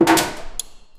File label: tool